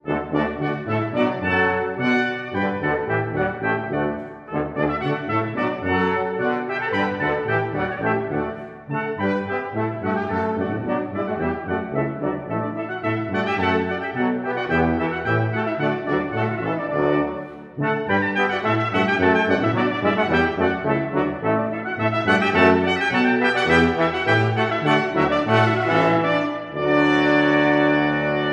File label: Bourree